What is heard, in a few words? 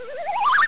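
slide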